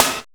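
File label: tough snare; NEWJACK; Drums In Your FACE!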